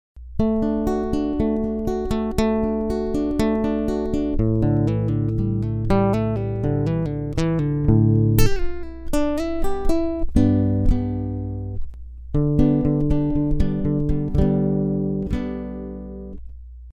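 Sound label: lead, Guitar 2 (accompany)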